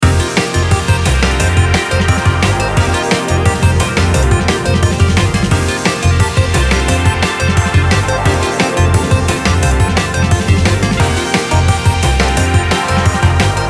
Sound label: Urban BGM